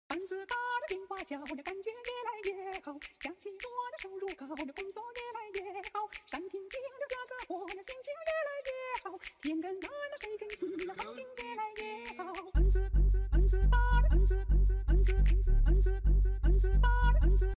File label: Old Chinese Woman Singing